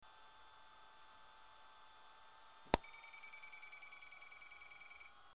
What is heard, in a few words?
mono400 Fun Sound